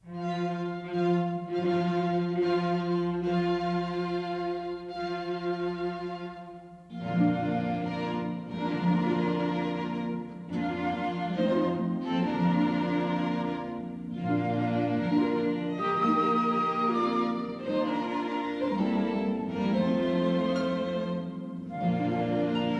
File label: Bridal Chorus, harp